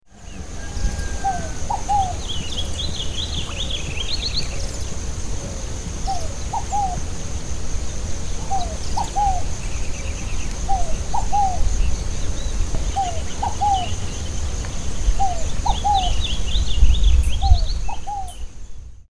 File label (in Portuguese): Columbina squammata Fogo apagou